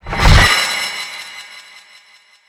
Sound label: berserk end